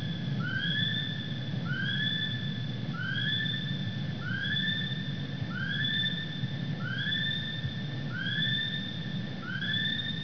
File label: male kiwi